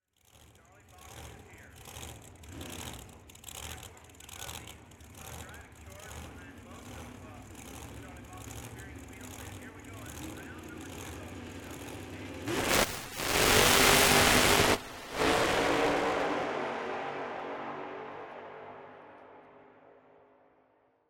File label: dragster starting